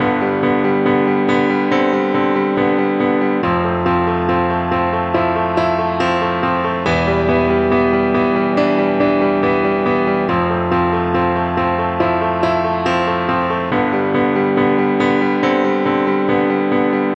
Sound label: Piano Ringtone